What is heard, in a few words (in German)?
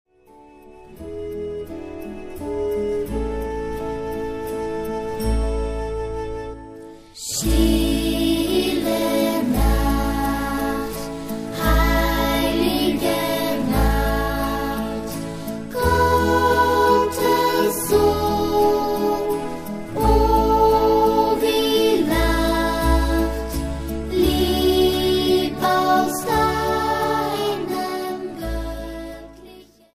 Stille Nacht, heilige Nacht